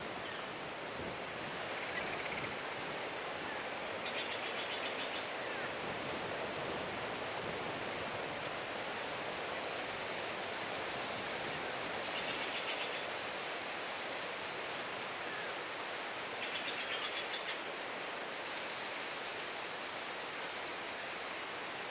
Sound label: Tropic Beach Amb Dist, waves & surf